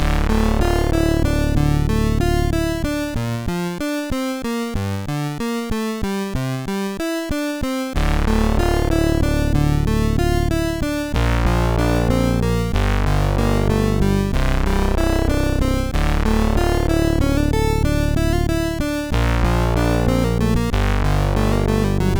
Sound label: MOS 6581 R4; sid